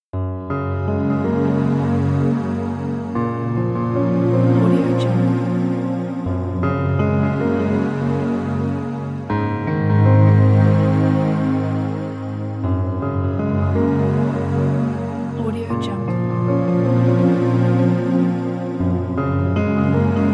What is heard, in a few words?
dramatic piano